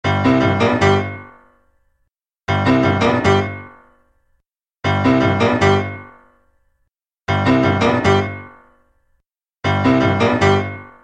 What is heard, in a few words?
piano riff speed